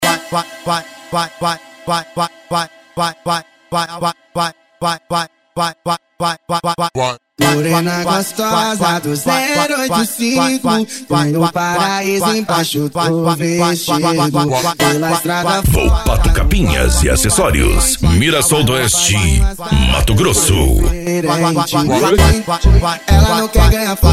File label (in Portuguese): funk consciente e hip hop